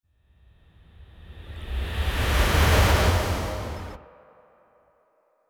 create short sound effect, score

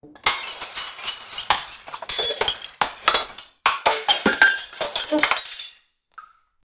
panic scramble claws

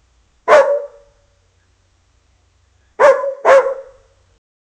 a puppy barking once

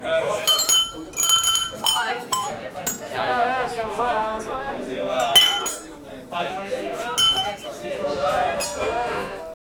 a group of friends toast

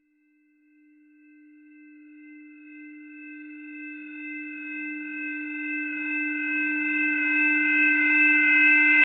energy boost activation r, energy

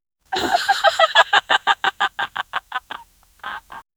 audience questioning and

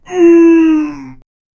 zombie sad moan

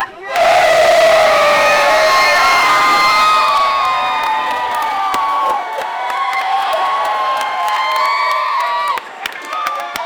crowd girls kids, concert

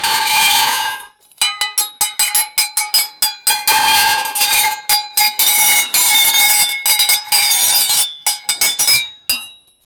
blacksmith iron; blacksmith iron belding

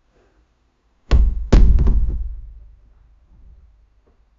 a hero using his foot, dungeon

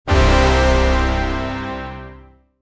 medieval arena victory so, noble

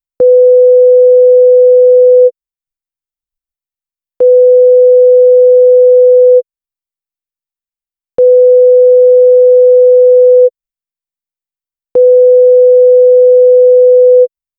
error sound beep; alert

